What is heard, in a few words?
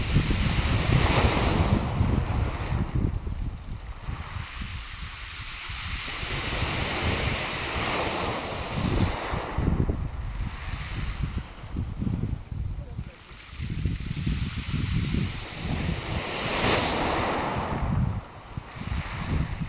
lyme regis amazing wave noises